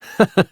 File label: chuckle